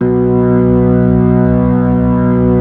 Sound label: B-3, Keyboards